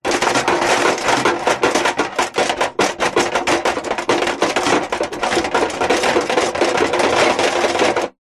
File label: foley metal loose small shake rattle